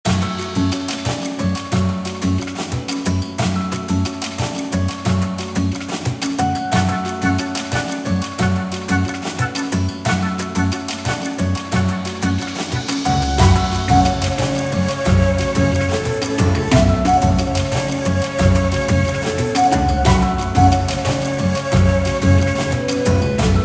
Battle; SuperMagical; iOS PS Vita Windows

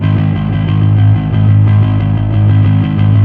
Fuzz G sharp 1; torchlight iii; ps4

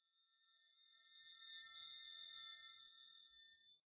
Chimes Reverse; gamerip; The Curse of Nordic Cove

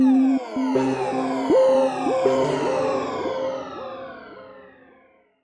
Basic Mood Alteration Prision

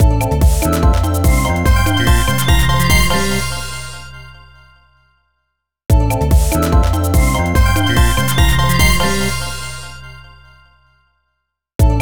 Minigame Success; Gremlins Gizmo; wii

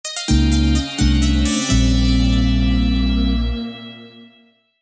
Jingle Dead

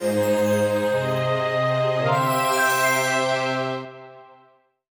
Jingle Upgrade; My Little Pony Color By Magic; android

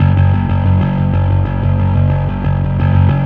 Fuzz F sharp 2; torchlight iii; ps4

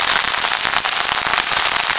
sparklers; Birthday Party Bash; Wii; Spanish

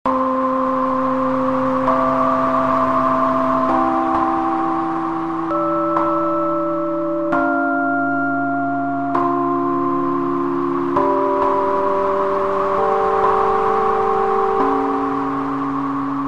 Calm; cruelty squad

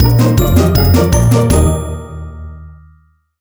End Tag, music; Scribble

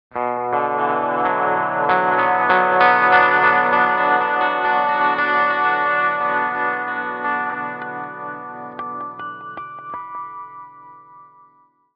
G steel

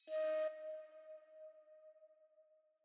Alchemy Flute Combo

